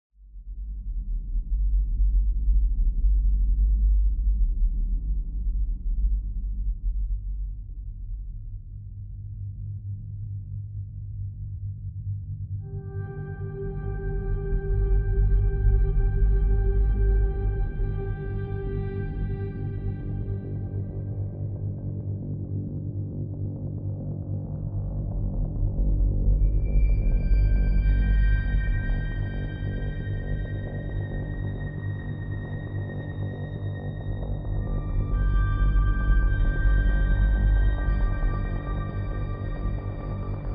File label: ambient